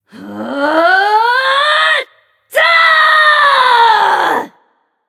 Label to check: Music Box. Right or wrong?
Wrong